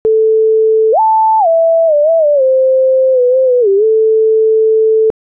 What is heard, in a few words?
sine portamento song; adlib